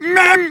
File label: jump; freak fortress 2; meemswarm; sniper